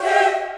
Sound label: choir